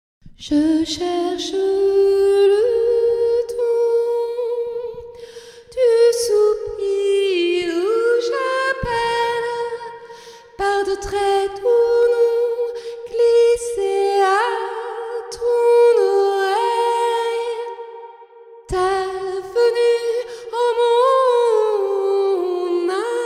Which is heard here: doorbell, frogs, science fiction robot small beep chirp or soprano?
soprano